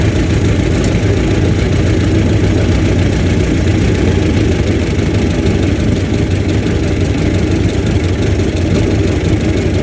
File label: exhaust sounds